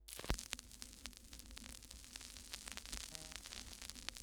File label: Vinyl Crackles